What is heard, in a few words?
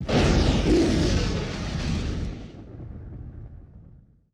WWII Explosion With Flare; Period Combat; Sony Pictures Sound Effects Series